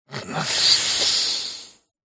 human male alsleep breathing interuption exhale moan